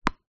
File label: sport tennis ball bounce hard court